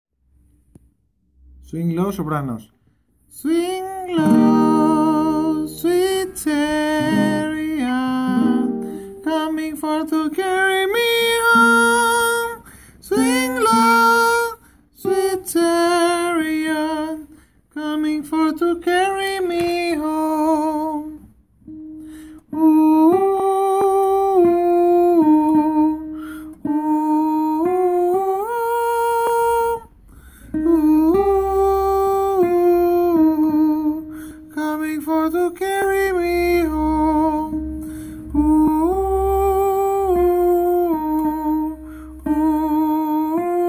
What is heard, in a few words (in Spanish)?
soprano